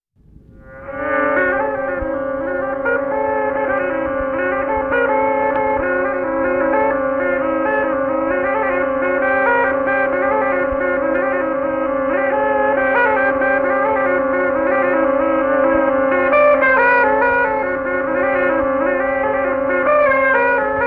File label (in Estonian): Mustjala vaimulik torupill; folk